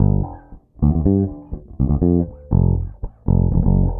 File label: 119 Old School Bass G, E-Bass; Pop Rock